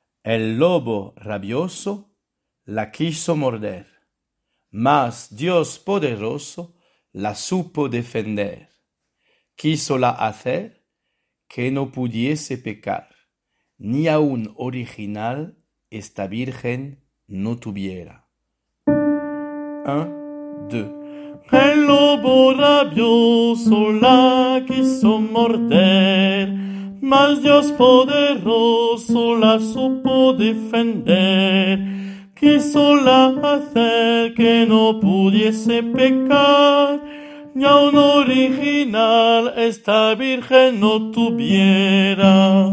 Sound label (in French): riu couplet bassi, chorale